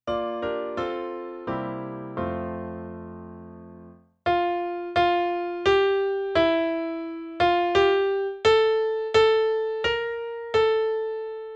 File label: in F Major